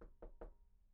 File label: knock; IEEE AASP